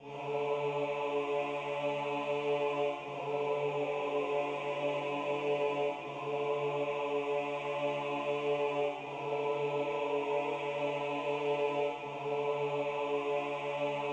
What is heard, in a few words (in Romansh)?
Tschiera Bass